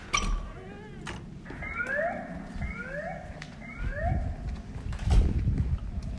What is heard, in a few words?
door beeping; Record some "everyday" sounds, process/transform/arrange them, creating a composition